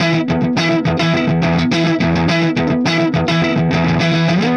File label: Clinical Distorted Funk Guitar